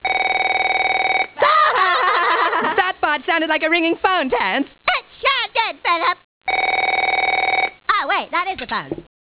Phone fart

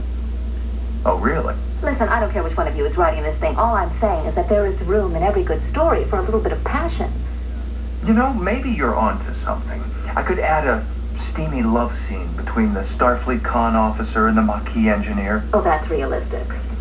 steam; "We could add a steamy love scene between.."

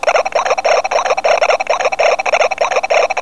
bubbles

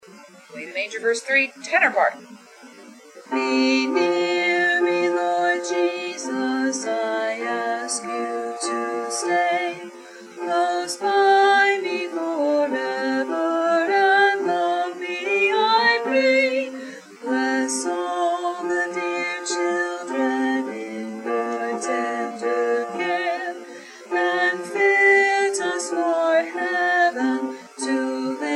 Tenor

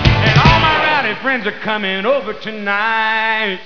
rowdy

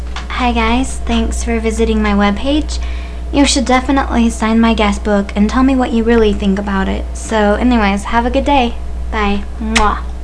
muah

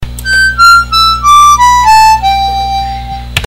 blowdown

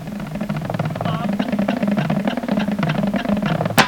Basses; Blue Devil's Bass line run

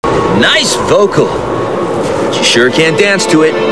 nice vocal; Ares; Nice vocal, but you sure can't dance to it.

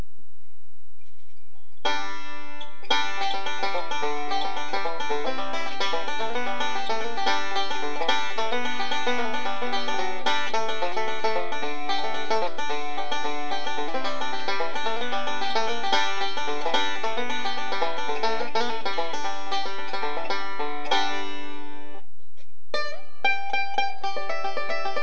Banjo Tune

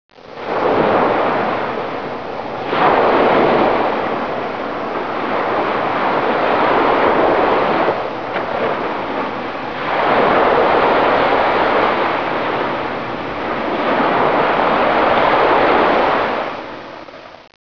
Ocean Waves

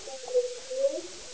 scared

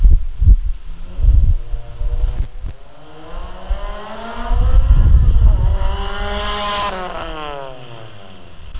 Silenced outside drive by; Exhaust

